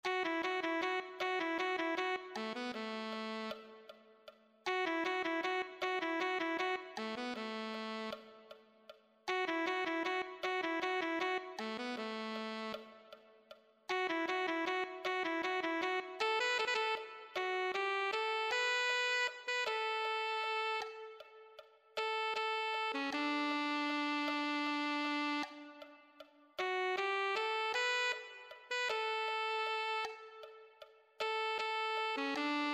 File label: alto saxophone